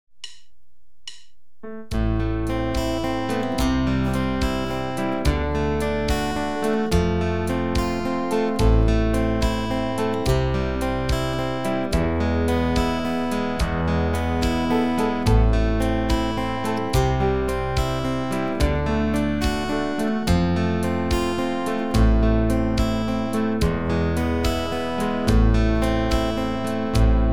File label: House of the Rising Sun, guitar